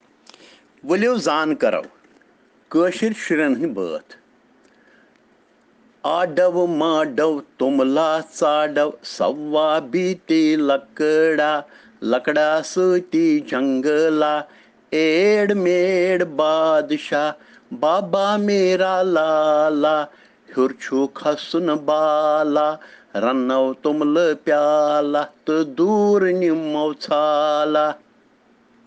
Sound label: Children Rhyms in Kashmiri